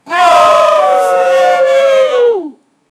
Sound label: congrats sound with cheer, joyful